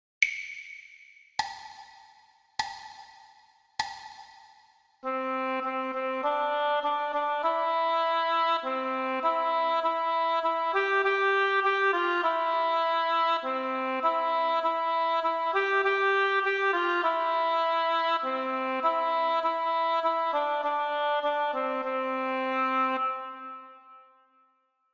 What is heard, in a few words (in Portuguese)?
percepcao ditado